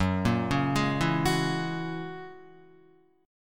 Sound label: Gb 9th Gb down Guitar 4F,4A,5C,5G,5C,6E; arpeggiated; JGuitar